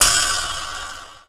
SPRING; Rarefaction; Poke in The Ear With A Sharp Stick

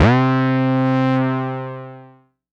lead C3, Korg MS-20; Polyend; Simulacrum Sounds; 1 Shots; Synths; Leads and Keys

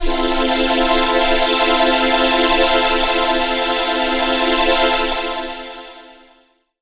Pad Wash; Zero-G Jungle Warfare 1, 2 & 3; 90 s Sample CDs

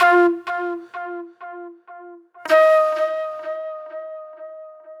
NY 70's Delay Flute; Sonic Foundry (Sony Creative Software); Manhattan Flutes; Session 01 - Benedetta

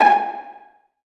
Via Pzz G#4; Digital Sound Factory Virtuoso

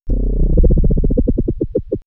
Skweeking Gate, sci fi synth fx; X-Static Goldmine

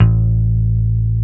BASSES, Roland

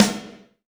MD PIC RM, Drum Mashines, SR-16 DRUMS; EdgeSounds

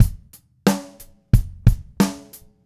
Red Maple Groove 20-inch Kick 90; Sonic Foundry (Sony Creative Software); Vital Drums

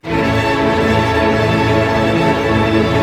STR ARP MA, Arpeggios, Arpegg.Major; Roland LCDP08 Symphony Orchestra

